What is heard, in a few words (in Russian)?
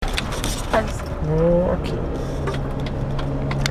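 супермаркет покупки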